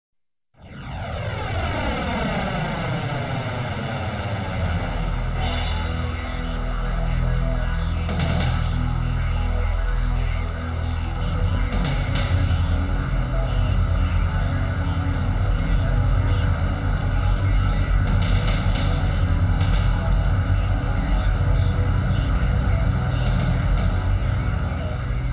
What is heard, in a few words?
jet